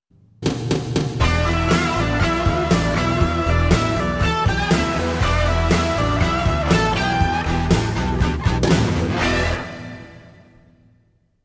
Strat

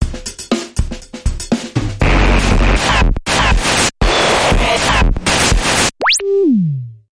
Earth Wind And Static Sci Fi Bumper

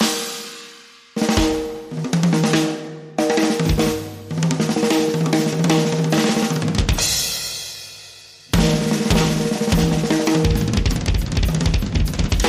Drums Only

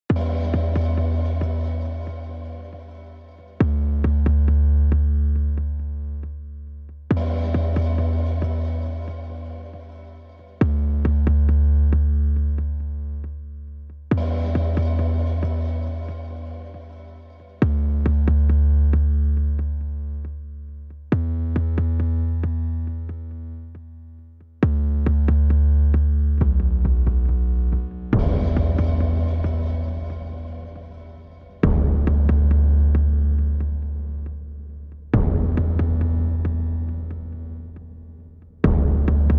Drums and Bass

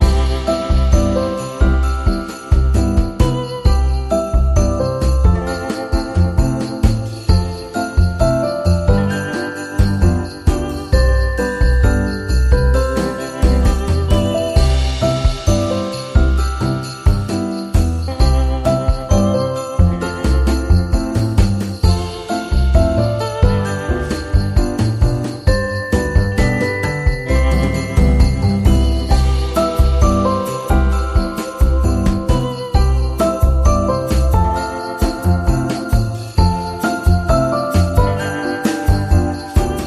Jazzy Jingle Bells

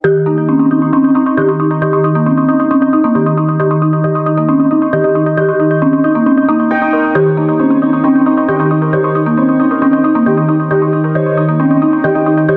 Ambient

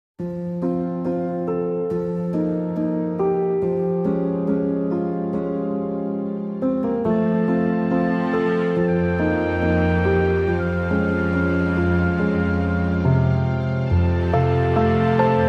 No Jingle Bells

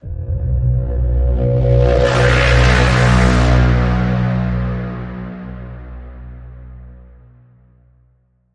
Bend, B

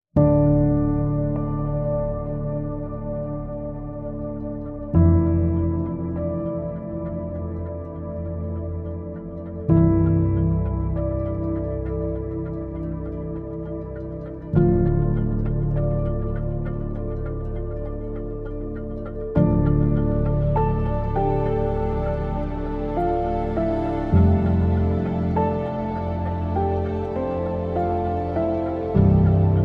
No Strings

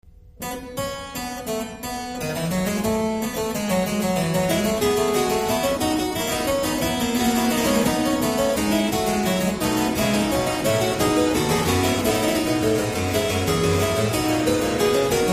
Fugue in F major; Fugue No 11; Johann Sebastian Bach

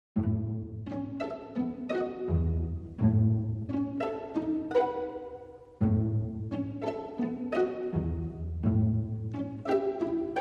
Strings Only

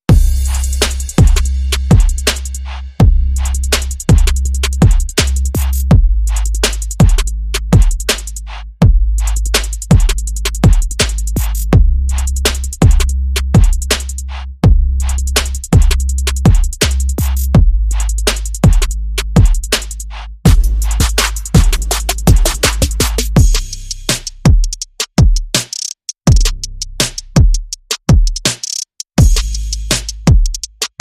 Bass and Drum

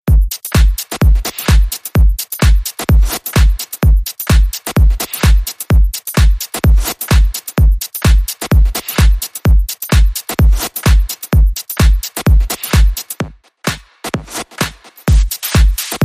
Index Beat

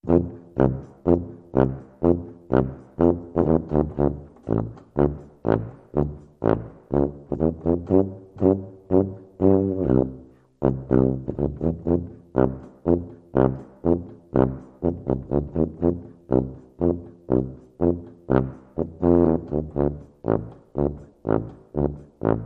Tuba Oom Pah March

